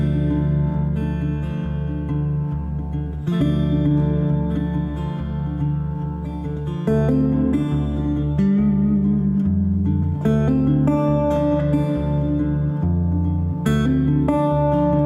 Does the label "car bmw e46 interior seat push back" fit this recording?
No